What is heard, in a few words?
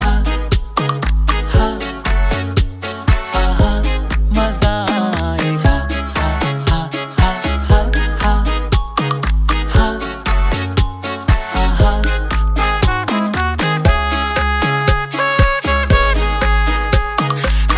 Instrumental